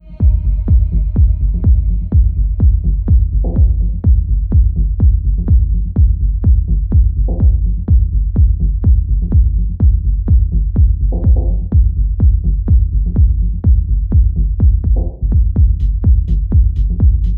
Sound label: Club Music; Neon Valley Revenge Unleashed